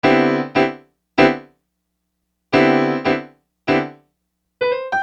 Acoustic Piano

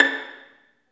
STR Violin, Solo Violin FX

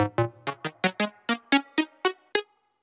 SYNT; USB Soundscan vol.47; AKAI; Hip-Hop & R&B

